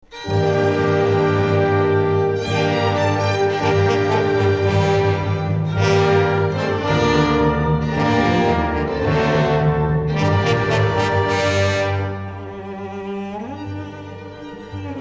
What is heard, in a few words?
Cello; Komorní filharmonie Vysočina, J.Klíč - Koncert h moll pro viloncello a orchestr